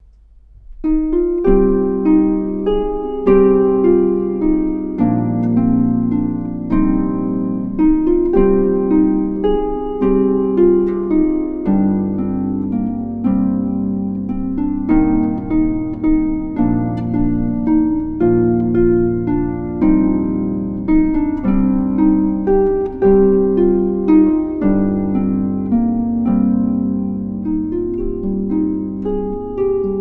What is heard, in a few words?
Welsh Carol (Harp only), Christmas Carols